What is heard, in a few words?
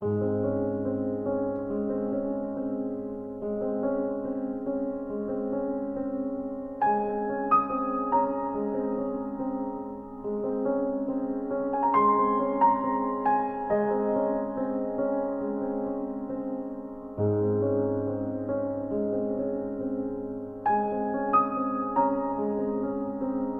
piano movement